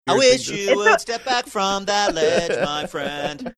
singing i wish you would step back from that ledge